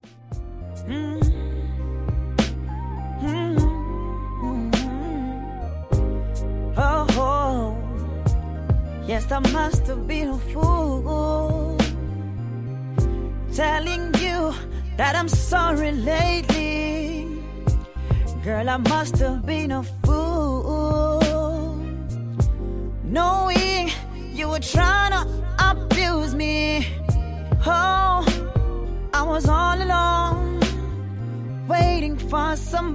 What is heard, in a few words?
rb love song freestyle; guyana